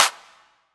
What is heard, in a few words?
Clap; osu